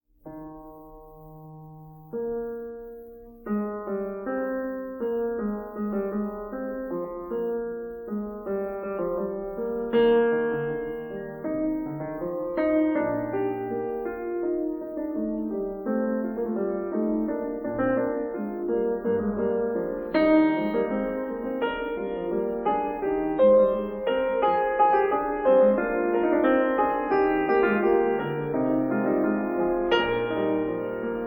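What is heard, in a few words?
No.7 in E-flat major - Fugue, piano; BACH; WTC; BOOK II; Feinberg, Samuil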